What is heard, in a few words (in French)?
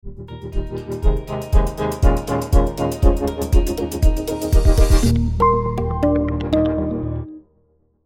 Logo Sonore Montee des marches; Astee